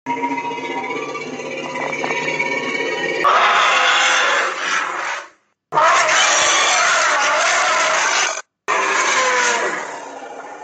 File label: Mammoth